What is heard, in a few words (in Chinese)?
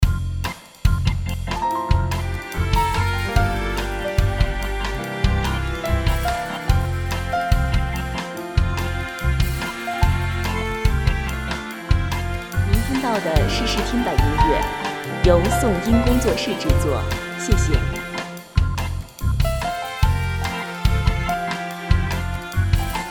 休闲游戏主界面, bgm